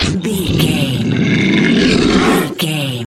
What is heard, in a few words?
troll monster effort struggle